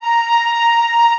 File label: flute